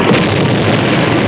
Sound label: fall; quake2; megatron